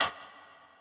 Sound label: tick